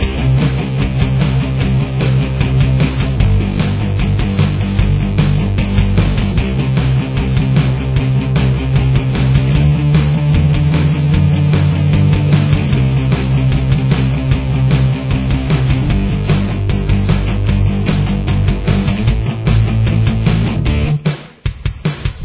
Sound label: solo backing, guitar